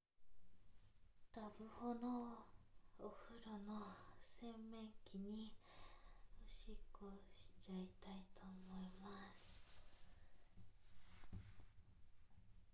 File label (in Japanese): ラブホで洗面器におしっこ！; 実演音声, ASMR